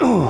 GRUNT

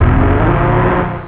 hoova

